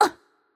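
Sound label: Korean hurt light; VO; ko KR; bloom minigame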